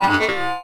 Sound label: Science Fiction Sci-Fi Electronic Warning Light